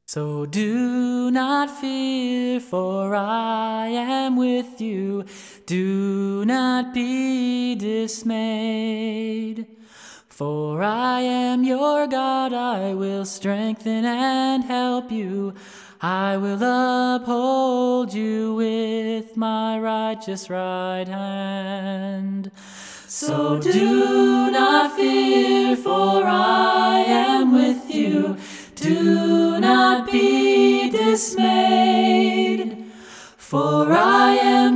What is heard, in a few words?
acapella